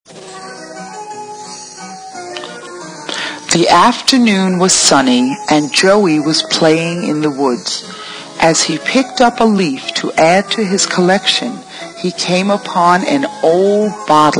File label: swings round bgm